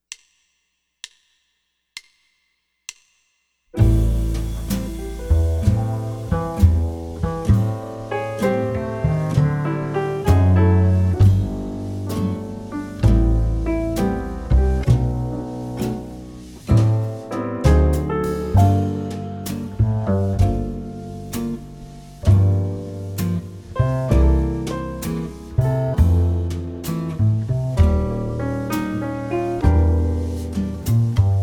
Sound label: all horns ballad, jazz; LMEA All-State Jazz